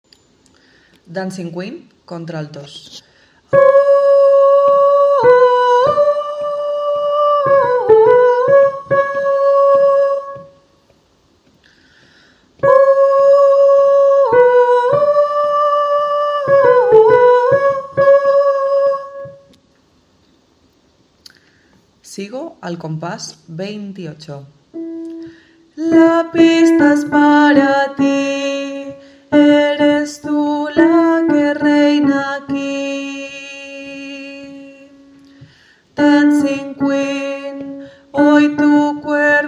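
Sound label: alto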